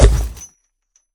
劫掠兽脚步声

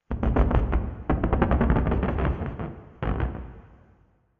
诡异森林嘎吱声; ambient

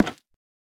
下界木活板门开关声

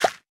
堆肥桶清空声

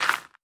堆肥桶填充成功声

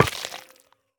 幽匿催发体破坏声

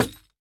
竹木悬挂告示牌破坏声

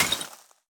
可疑的沙子破坏声

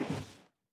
船在陆地划桨声

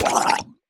溺尸受伤声

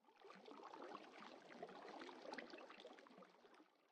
水下水流声; ambient

